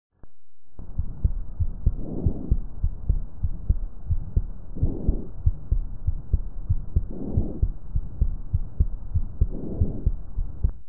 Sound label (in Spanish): Pulmon Niño